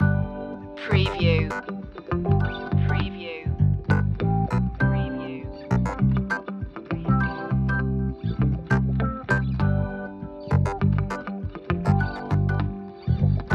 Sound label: easy funk comedy no drums; 70s retro